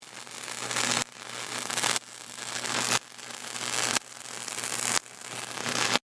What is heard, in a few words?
Electrical Crackle, Industrial, Pulse, Jacobs, Ladder; Factory Sound Effects; Blast Wave